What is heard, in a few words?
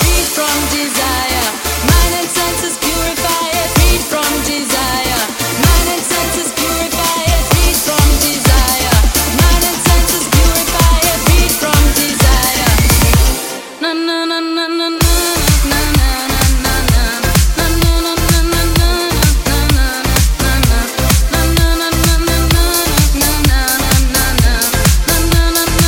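Tech House 128bpm D minor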